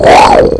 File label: Lion; Safari 2.4.2